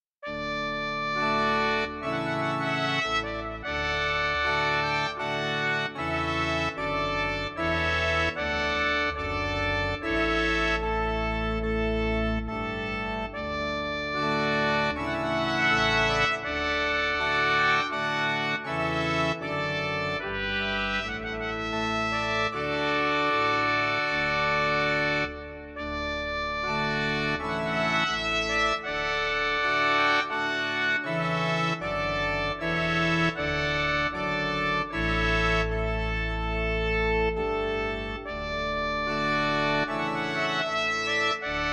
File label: Trumpet Voluntary, piano